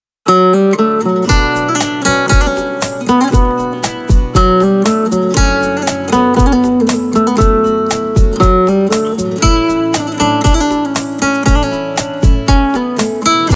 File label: Ringtone